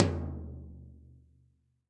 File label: Tom Floor SteelyTight; Drum Hits; Standard Hits; Ableton; Factory Packs; Session Drums Studio